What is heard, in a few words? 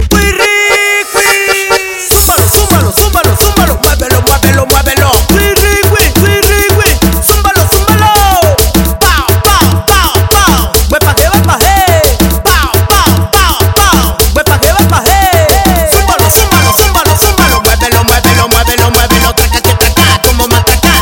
tribal mix, ringtones latino